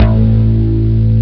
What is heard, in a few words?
m1 synth bass, Amiga Soundtracker Sample Packs; ST-XX Amiga Soundtracker Sample Packs